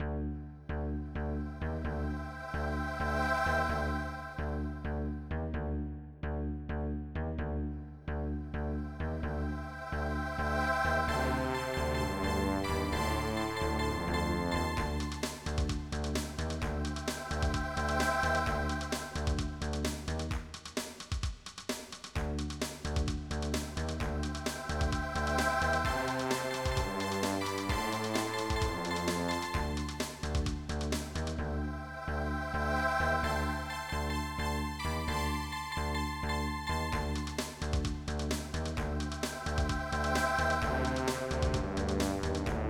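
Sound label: digital brass, keyboards; Monster Media No. 2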